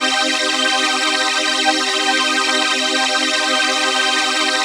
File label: PHASE PAD, ATMO PADS; Best Service; XX Large